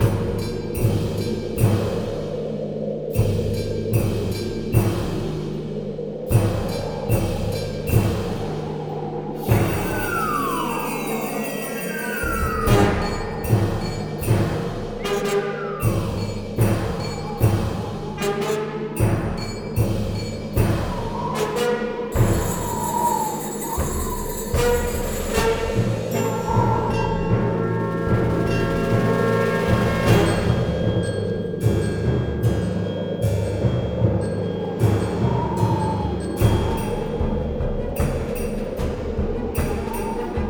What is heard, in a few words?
Ice Music; EyeToy Play Hero; PlayStation 2